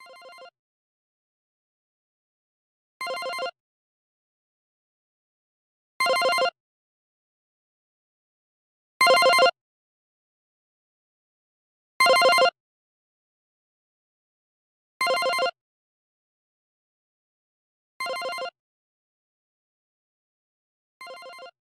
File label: Exec Ring Fade